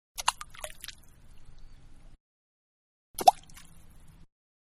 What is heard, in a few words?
Splash 2 Separate Pebbles Into Water; Water (Sound Effects:Nature); Pebble-Two Separate Into Water; Manhattan Production Music